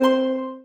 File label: PUZZLE Success Harp Two Note Fast Wet; stereo; Universal Sound FX